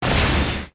Sword Jump Strike Boom

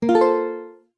Collections Open

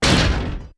Iron Boots Step

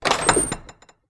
Chest Open

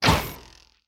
Bow Shoot

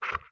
Wolf Huff; Wolf Link

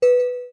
Pointer Offscreen

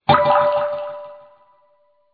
Tear Of Light Collect

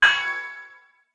Save Menu Slot Cursor

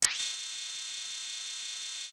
Hawkeye Zoom In

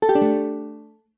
Collections Close

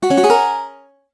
Save Menu Continue Yes

